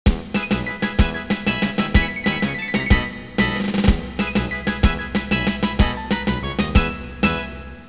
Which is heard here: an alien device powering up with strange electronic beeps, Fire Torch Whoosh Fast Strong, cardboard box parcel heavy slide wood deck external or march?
march